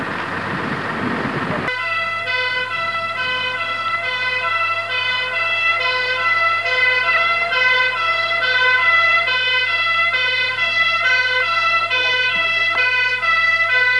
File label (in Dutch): sirene